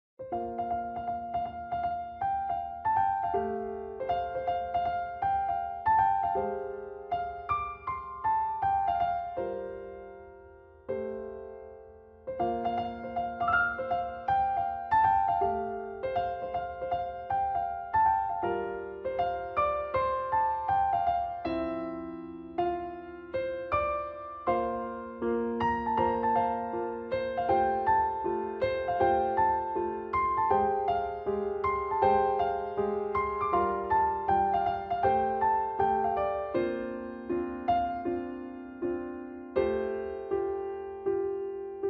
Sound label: piano